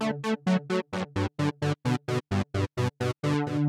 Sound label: Melodic Pizzicato